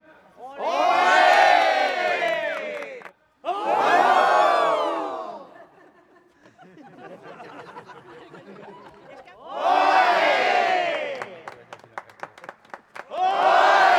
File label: crowd bullfight goes wrong; Spanish; Crowds